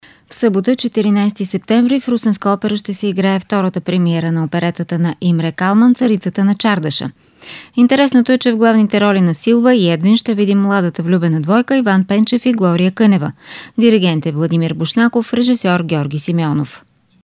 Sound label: oohh